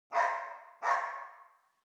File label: amb Dog; netent